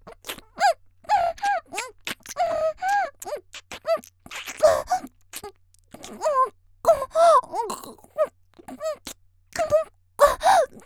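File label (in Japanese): （少女）「喘ぎ」口にも入れられくぐもった状態, 元気な少女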